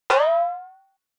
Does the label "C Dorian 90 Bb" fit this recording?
No